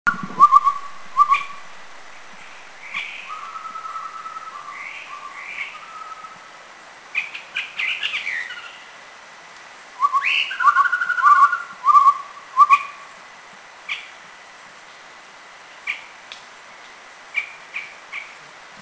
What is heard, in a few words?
Chestnut-rumped Babbler